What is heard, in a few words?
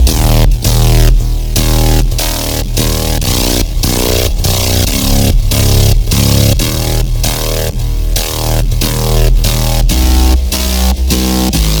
noisy bass loop